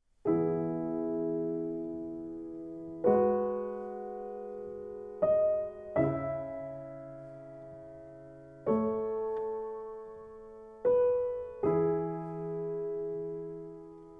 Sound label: Mines, piano; Stardew Valley Piano Collections